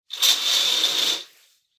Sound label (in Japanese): レースカーテンを開ける音; curtain